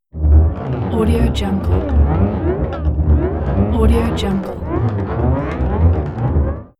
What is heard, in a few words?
bendy twang